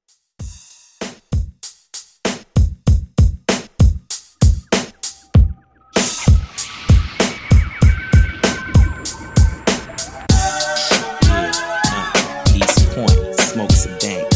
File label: 90s Old School 97Bpm; Redrum